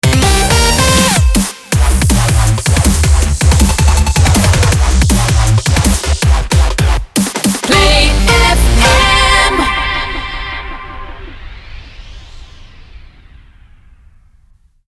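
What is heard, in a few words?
160bpm Cmin MIX Ramp, jingle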